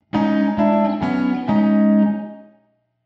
Basic Guitar Plate Reverb

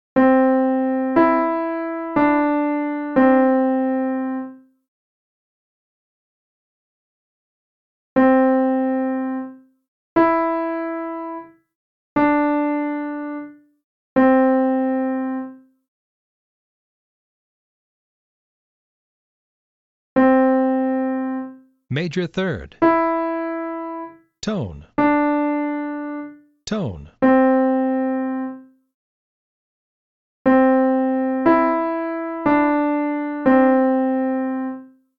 Easy Melody Intervals; Easy Melody 3: Hint

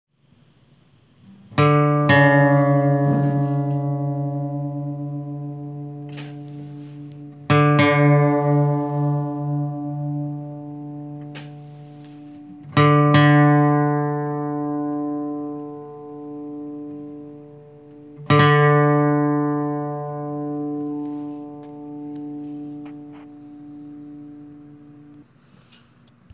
D string; being tuned

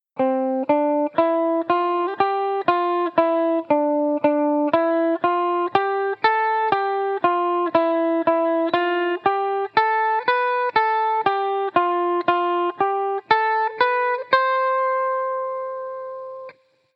scale example; C Major playing pattern variation 2

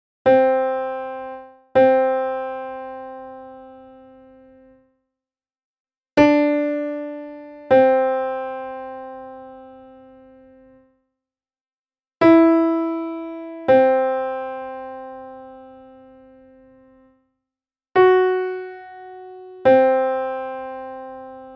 Lydian Intervals; With Tonic

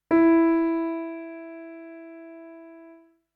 tonic

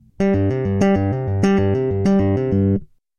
Electric Bass Basic Tapping; Basic Tapping Example

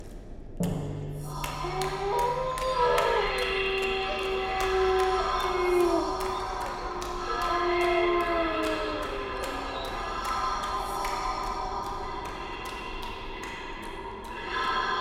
Dreamscape Sound FX

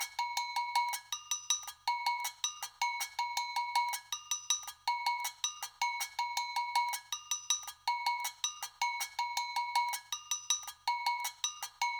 Agogo Bell; Latin Percussion

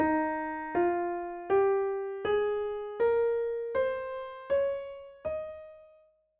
Eb mixolydian

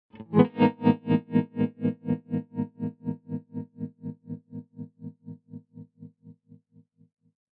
Tremolo Square auto-pan

